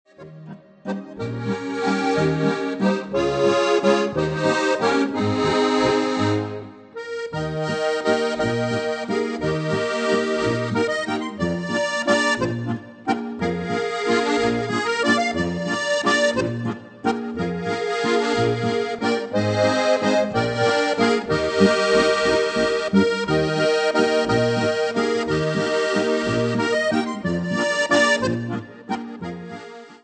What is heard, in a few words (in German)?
walzer